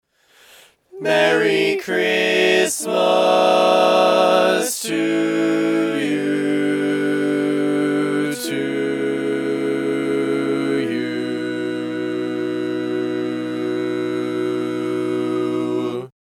Merry Christmas To You, All Parts, barbershop tags